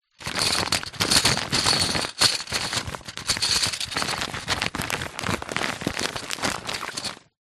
office padded envelope containing small plastic items handling; zapsplat